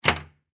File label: Foley Door Hotel Close Interior; Miscellaneous Doors (Sound Effects:Doors)